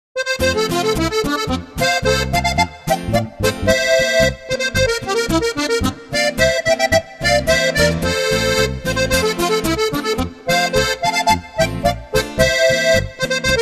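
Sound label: a flinke polka, Steirische Harmonika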